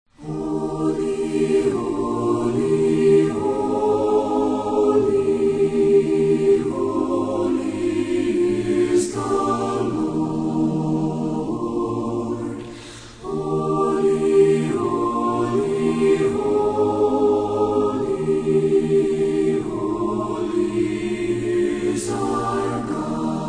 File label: Choir And Orchestra